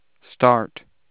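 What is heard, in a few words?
Mary Lamb Simple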